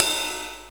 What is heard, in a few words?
Cymbal Sound Sample D# Key, Royality free cymbal sound clip tuned to the D# note